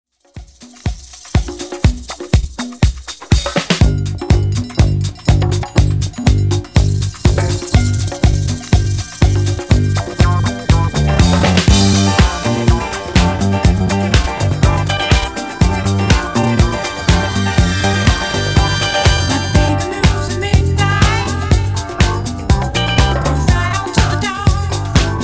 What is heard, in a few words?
Redrum 122 Bpm